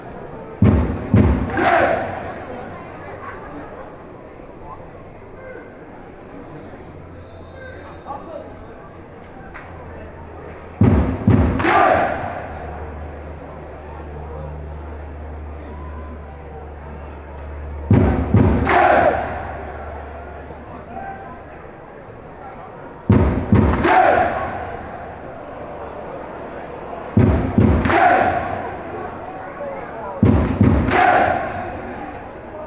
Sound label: hey klap